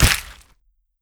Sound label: Bone Break; casualtiesunknown